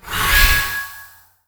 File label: healing; hero; priest